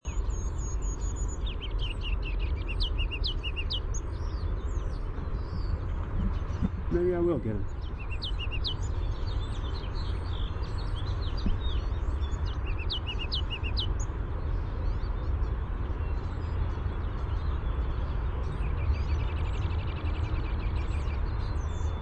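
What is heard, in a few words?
song, ruby-crowned kinglet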